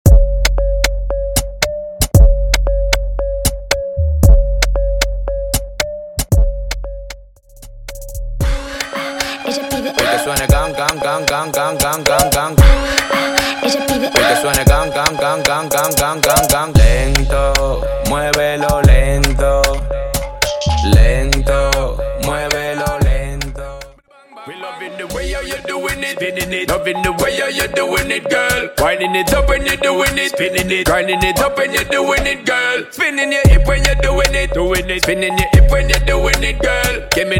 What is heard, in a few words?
Funk Intro Break Outro 115BPM